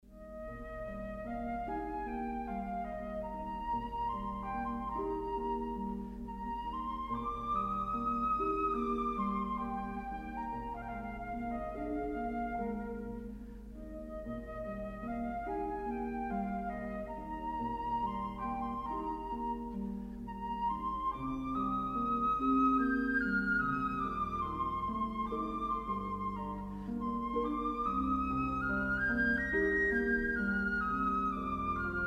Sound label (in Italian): flauto solo; strumenti